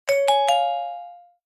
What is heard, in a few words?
new notification